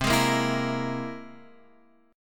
C Augmented 7th, Guitar, Half Step Down

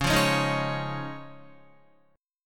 Db Major Add 9th, Guitar; strummed; JGuitar

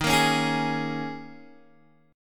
D sharp 7th Suspended 4th D sharp, Guitar Open G

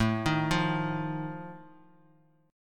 Eb Suspended 2nd Flat 5th A

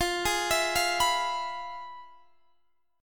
F Minor Major 7th Flat 5th, guitar chord

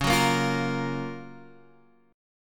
F sharp Major 7th

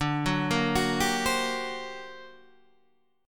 G Minor Major 11th, D

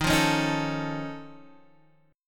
F Augmented 7th D sharp, chord

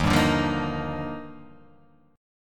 Eb Major 9th; strummed; EbM9

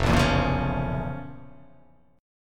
F Major 7th Suspended 4th Sharp 5th

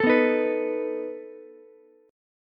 A Suspended 2nd Flat 5th A down Ukulele; Asus2b5 strummed

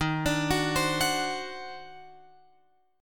D sharp Minor Major 13th D sharp

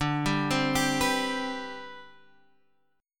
D 13th Suspended 4th D; D13sus4 arpeggiated; JGuitar

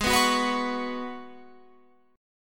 G sharp Minor 11th G sharp Guitar Open F, chord; G#m11 strummed

